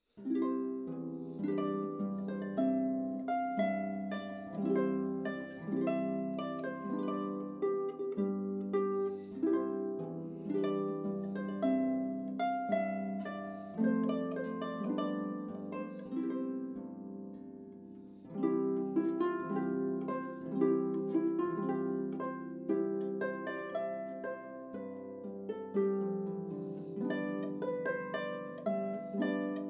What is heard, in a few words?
Marcia Trumphet Voluntary J Clarke arpa